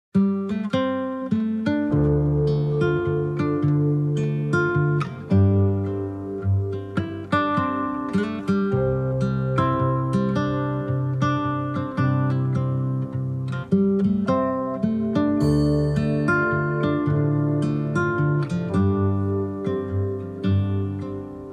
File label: music